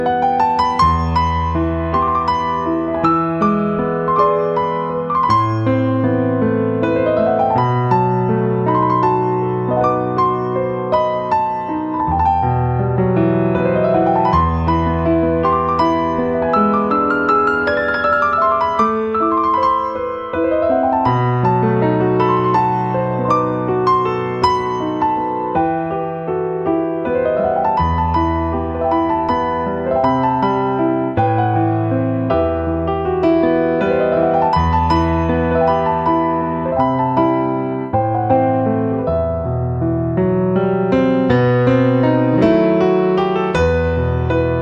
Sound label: Piano